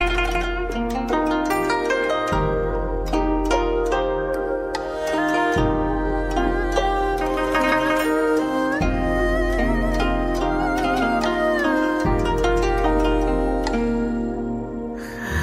beautiful chinese ringtone

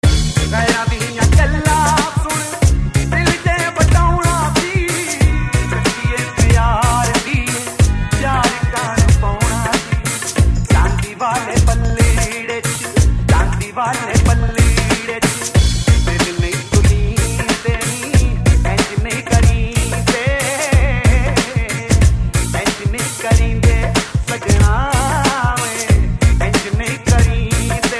punjabi ringtone

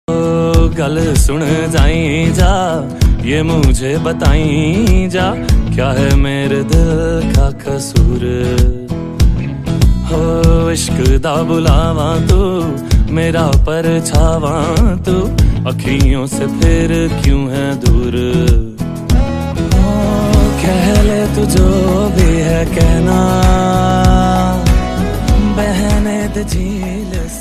romantic song

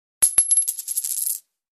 coin fall ringtone

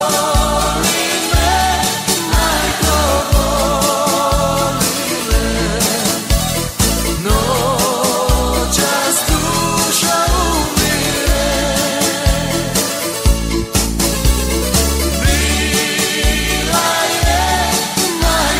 romantic croatian music

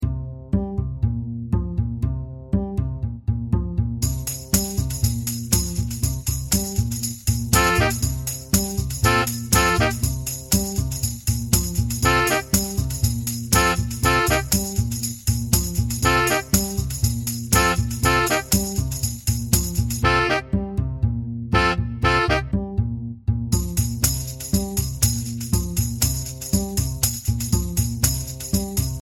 latin groove

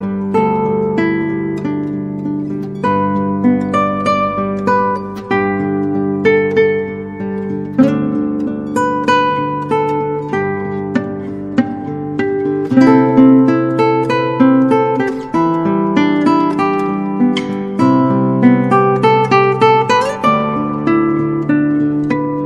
taiwanese folk

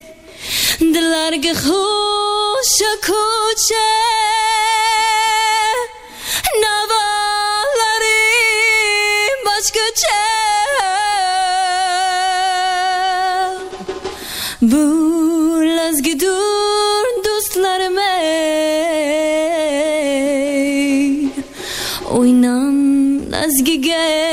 lazgi uzbek song ringtone

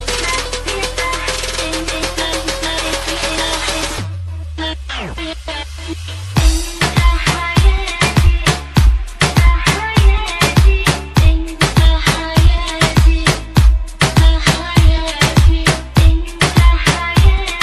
remix car arabic

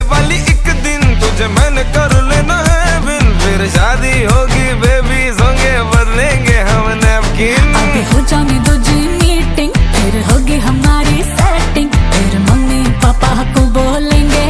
indian song ringtone

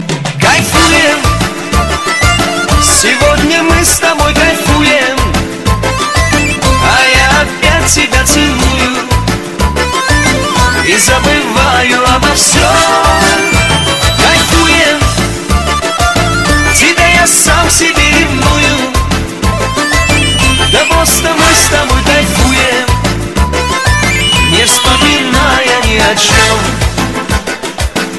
russian music

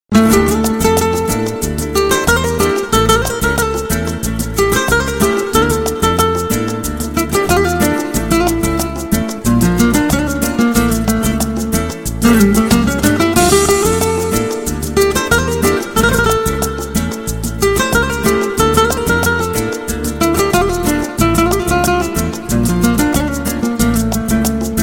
spanish guitar

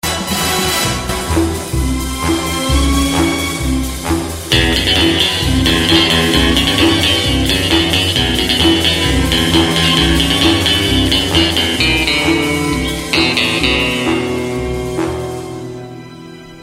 movie theme music, ringtone

